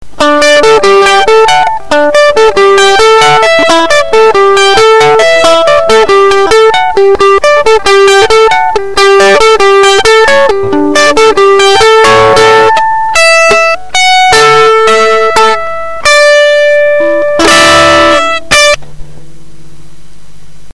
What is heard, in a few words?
fuzz